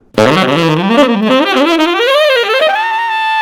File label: Monster Lick